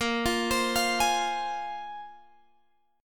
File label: Bb 7th Suspended 2nd Bb, Guitar Open G; x,15,15,17,18,18